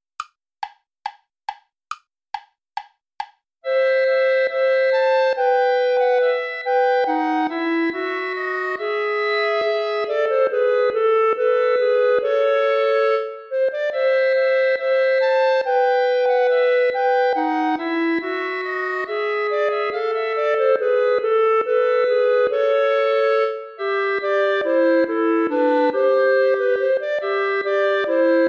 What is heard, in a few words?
allemande play along without clarinet